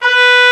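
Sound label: Trumpet mf B3; Digital Sound Factory Virtuoso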